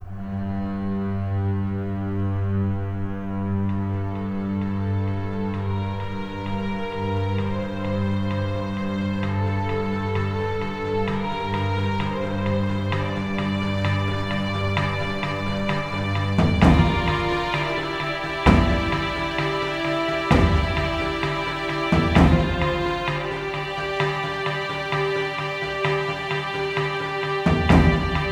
Bonus

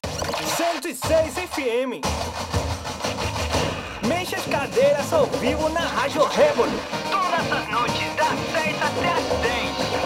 Radio Car Brazil Jingle; gangstar rio city of saints

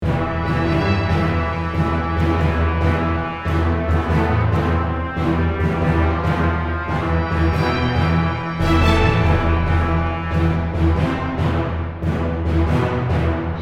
Reactor On, soundtrack

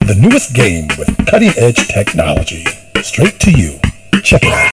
Entering Cool Mode

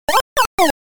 ottotto (whoops-a-daisy); Dig Dug II; arcade

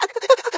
frozen vocal; Scrat

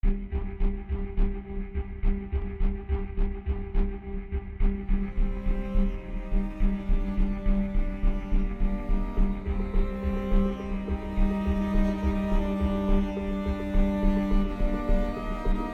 Puzzle Solving (alternate take - more strings); Lifeless Planet

